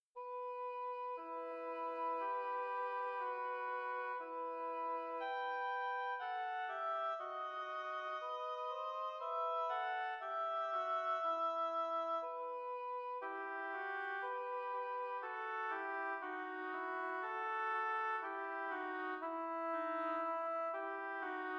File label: oboe duet; ernest krahmer